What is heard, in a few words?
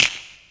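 SN, snare, claps; EastWest; Dance Mega Hip Hop Electro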